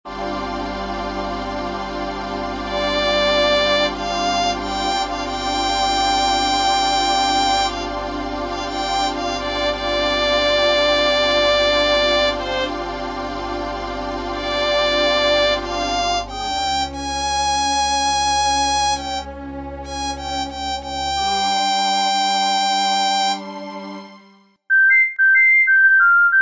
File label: Violin